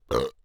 burp; OPENAIR; Anechoic

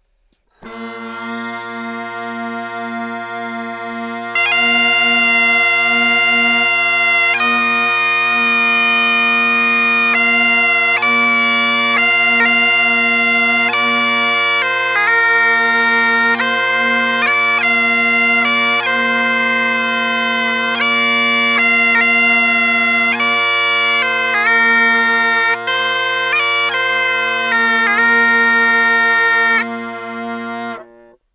bagpipe music, Anechoic; OPENAIR; acoustics and psychoacoustics book

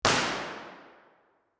impulse response Heslington church

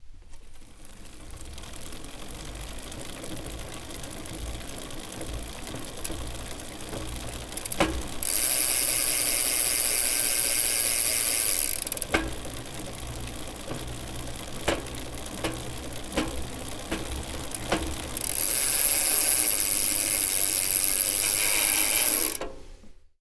bike, anechoic bicycle; OPENAIR

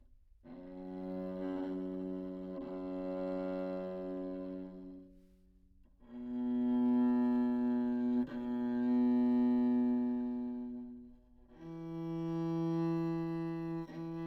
open, tenor viol back microphone; Anechoic; OPENAIR

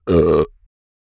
burp; OPENAIR; Anechoic

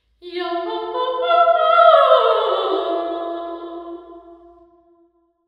clifford tower singing

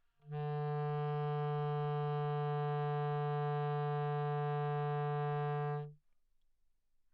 modern clarinet bb, low, Anechoic; OPENAIR

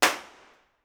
creswell crags; b-format

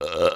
burp; OPENAIR; Anechoic

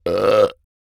burp; OPENAIR; Anechoic

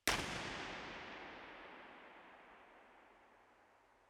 terrys warehouse; factory warehouse; surround 5 1

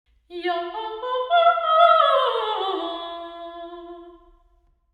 sing, St Andrews church

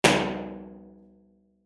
bottle dungeon, falkland palace; omni

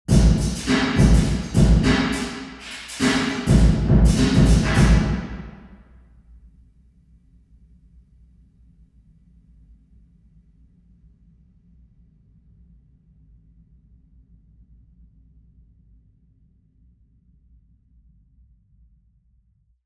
drum, parish church

